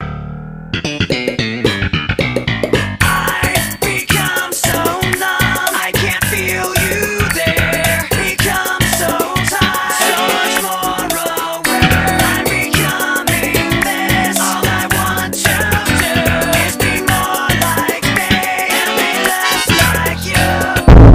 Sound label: music